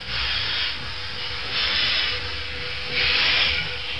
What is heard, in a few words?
steam